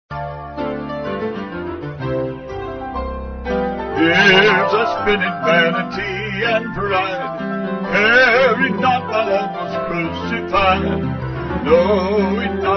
Vocal Piano; Years I Spent In Vanity

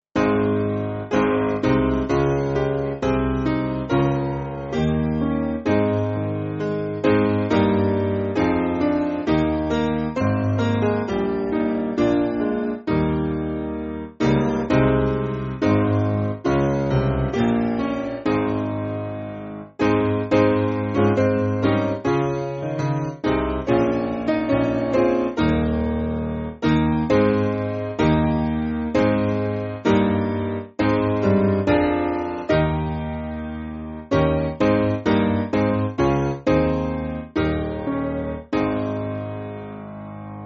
Piano, Music Backing Files for Churches; Grosvenor

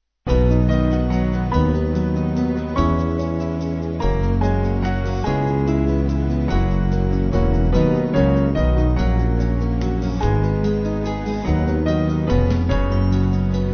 Band

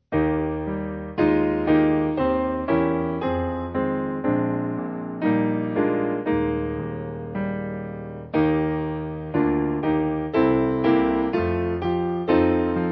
Piano, Free Music Backing Files for Churches; Adsum

